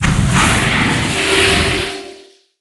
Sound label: avengers shield